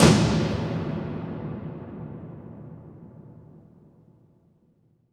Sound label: toms, cinematic impact, drum